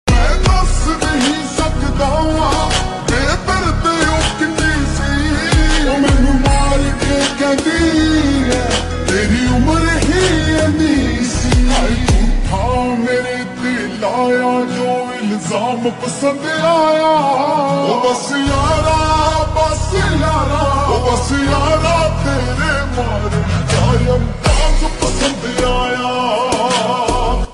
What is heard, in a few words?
Ringtone, slowed and reverb